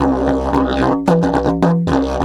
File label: 108, DIDGE 108BPM